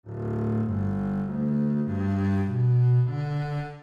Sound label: viola da gamba; AJ Hipkins